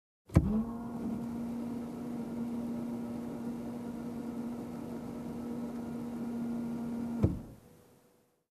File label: Soft electric window lift